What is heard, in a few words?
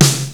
snare; hofclient